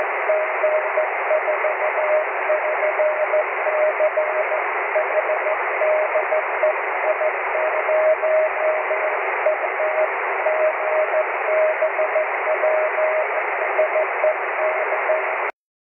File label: cw; 23cm